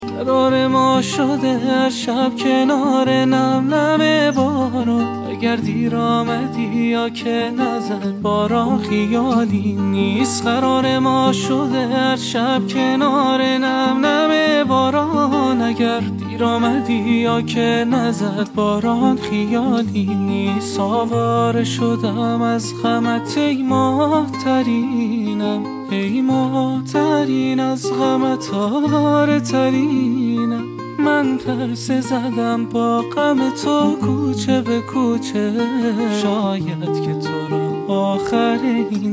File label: Guitar